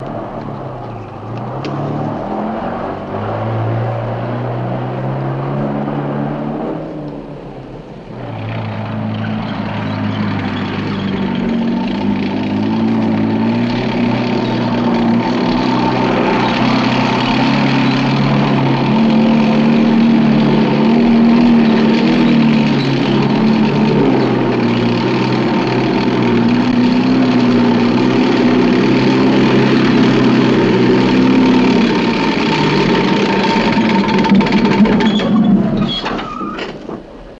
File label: Tank Runs On Sml Ups Do, tank mvmt